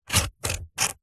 office marker pen draw triangle shape on dense foam or polystyrene; zapsplat